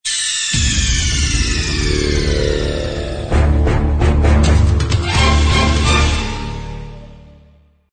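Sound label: metal gear game over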